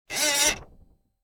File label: typewriter sound